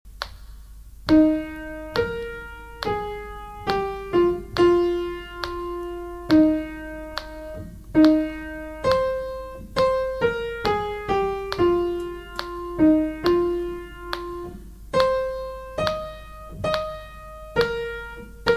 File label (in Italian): contralti; pane di vita nuova